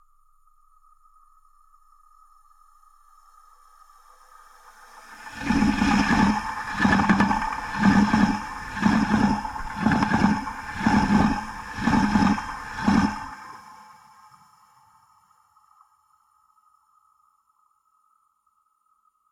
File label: contact mics on rails, Passing Trains; Detunized